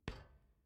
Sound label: Player Footsteps On Metal Crate Step; Main Character